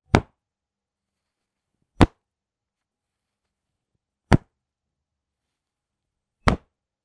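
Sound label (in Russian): звук резинки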